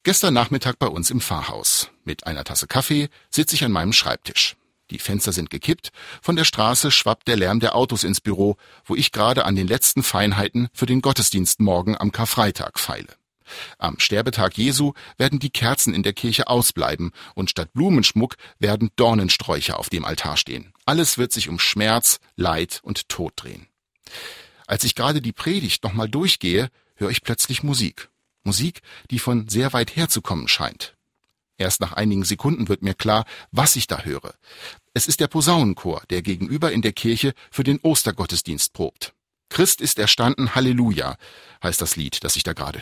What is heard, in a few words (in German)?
Hintergrundmusik; zwischenruf; Kirche auf Sendung